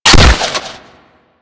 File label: Hunting Bow Prime Fire 3D A; Warframe; Weapons